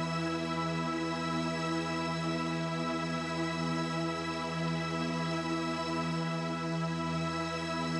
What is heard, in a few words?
Drone; stereo toolkit samples; Non Tempo Loops